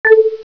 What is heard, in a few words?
Dual electronic tone (activation notification only in dialog box); Enter key in dialog